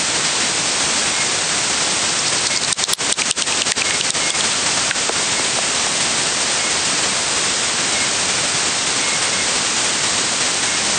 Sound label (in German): Fledermaus; SDR